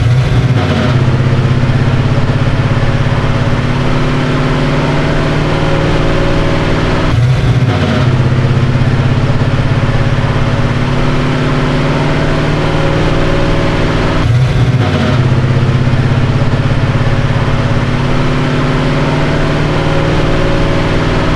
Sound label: third, limousine; vehicles; sligwolf